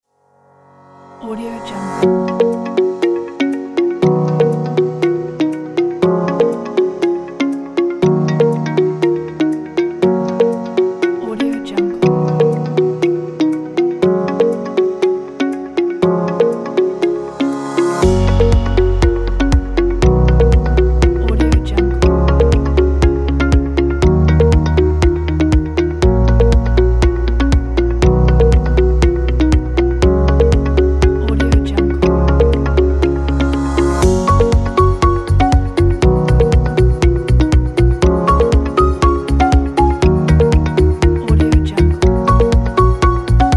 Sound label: happy music